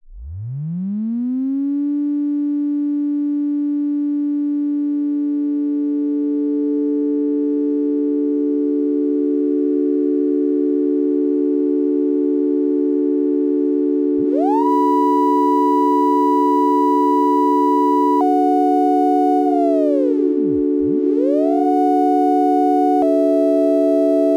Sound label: Sign Wave